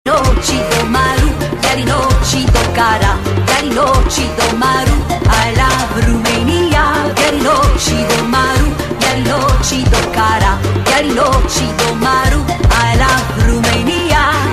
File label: Oriental